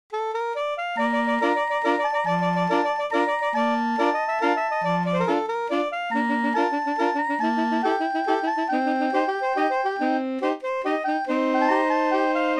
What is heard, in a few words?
english waltz, draaiorgels